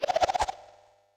Pinwheel Attack; Kirby; Super Smash Bros

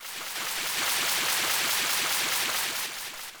Fish Swimming; New Super Mario Bros. Wii

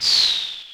Volcano Rock; Wii

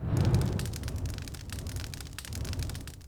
Fire Snake

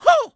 jump; super mario sunshine

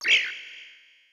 Staryu Firing; Super Smash Bros

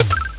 Yoshi Stomp; Wii

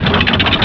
crank; mario 64

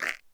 Hang From Rope; New Super Mario Bros. Wii

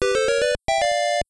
bonus chance start; super mario bros 2 nes

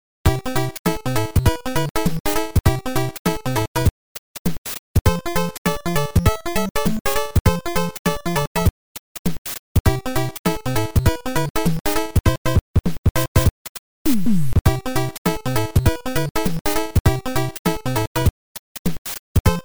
world map grass land; Super Mario Bros. 3

